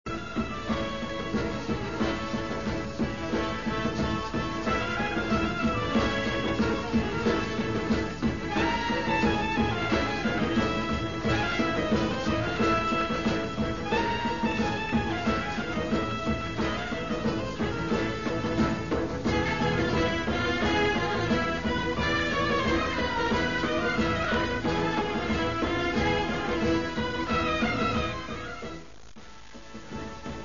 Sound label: oriental dance